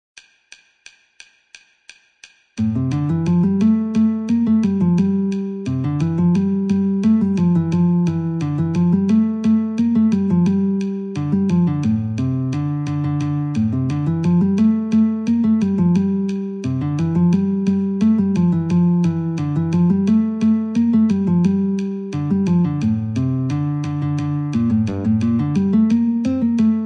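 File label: Guitar metronome 88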